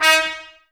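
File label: Brass trumpets D#, Mute; Miroslav Vitous - Woodwind Brass Ensemble; Tps Mute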